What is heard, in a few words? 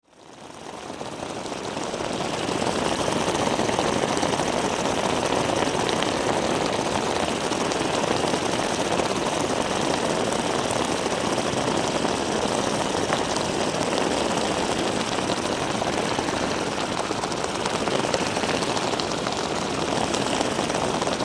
paint pots